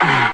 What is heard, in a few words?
"ah" exhale